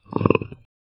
sudden hollow skull roar

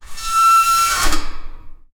sci-fi

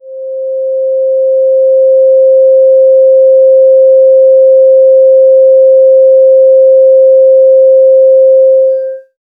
calming 528hz, healing

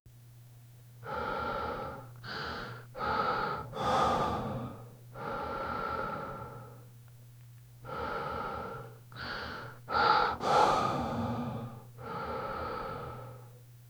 ambience

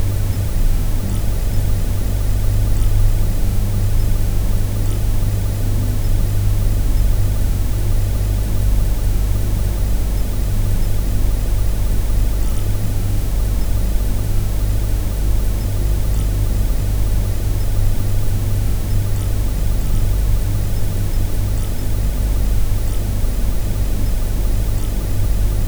quiet indoor room tone; reflections

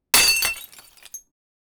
broken

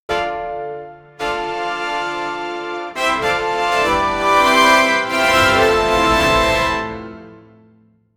create a happy triumphant, champion

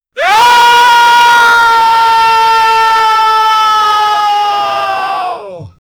create a high pitched scr

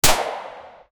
just one shot from ak47

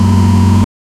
clean idle engine sound, land cruiser

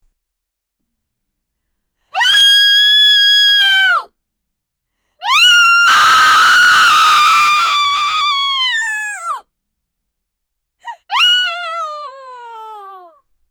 woman screaming in pain; injury

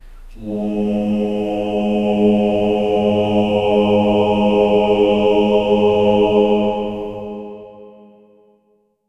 soft warm comforting chur, gregorian

